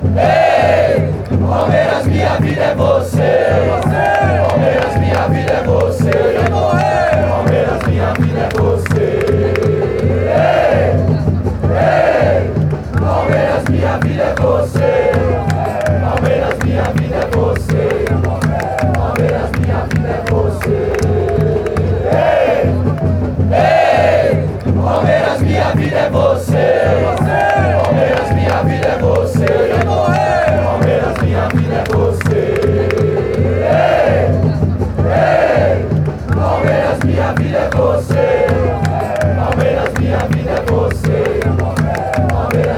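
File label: fanchants, football chants; eh palmeiras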